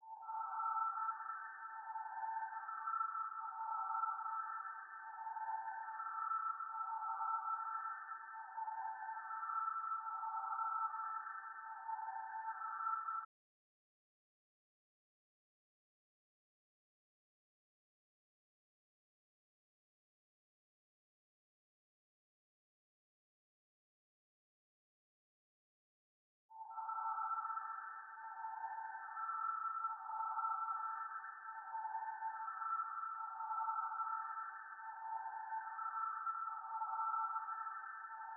145 BPM D Min Whistle; Melody; Trap; Loop Stems; Cymatics; 2022 Melody Collection - Trap Pt 1; Bloodline

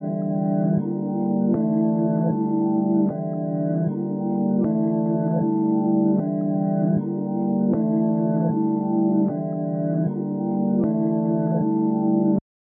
155 BPM D Min Low Pad; Reverse; Loop Stems; Cymatics; Throttle; 2022 Melody Collection

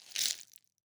Cowrie Shekere, World Percussion; Cymatics; Emperor World Percussion

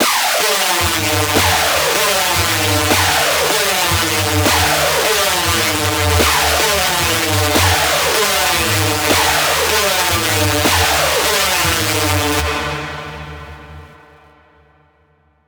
screech loop D min 155; Music Loops; GhostHack

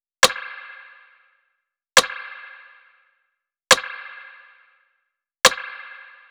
Drum Loop, 138 BPM Rim; Loop Stems; Cymatics; Vibes II Drum Loop Collection; Rings

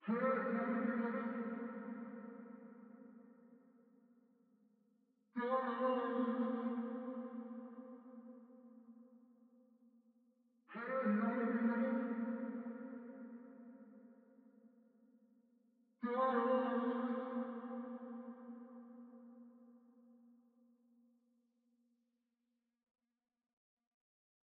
90 BPM C Min Vocals; Melody; RnB; Loop Stems; Cymatics; 2022 Melody Collection - RnB Pt 1; Deep Love

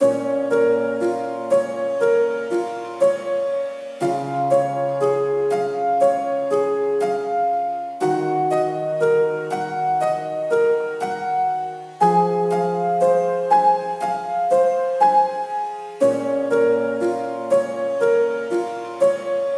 Piano - Bbminor 60BPM; STEMS; Ghosthack; SkyRealm; Mood Kits; Mood Kit 07 - All Is Not Lost

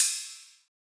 Ride; Hats and Cymbals

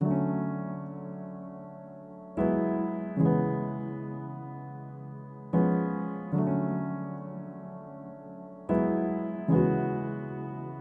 152 BPM D# Min Piano; Loop Stems; Cymatics; Make You Mine

